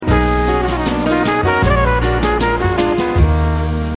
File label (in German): akkord